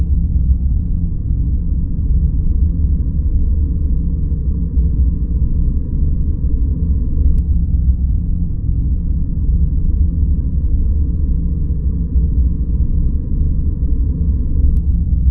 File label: music rumbling